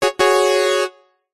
multimedia game tone fanfare synthesized brass simple; zapsplat